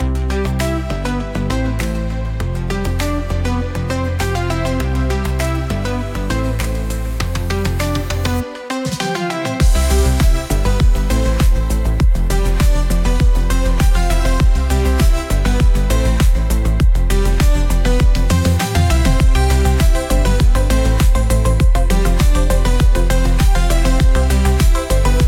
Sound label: Pop